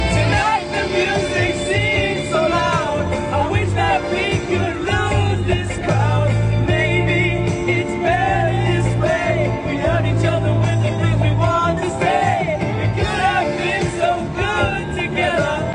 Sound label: CROONING COP